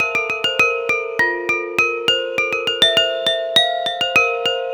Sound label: Pelog Demung Hi; Melodic; Ambient-Melodics-II